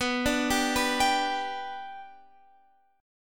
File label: B Minor Sharp 5th, Guitar, Half Step Down